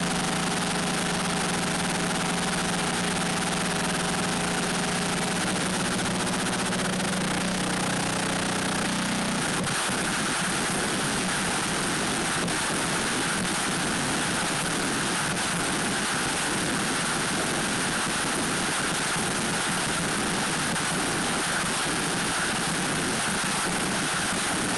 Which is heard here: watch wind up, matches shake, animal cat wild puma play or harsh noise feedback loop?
harsh noise feedback loop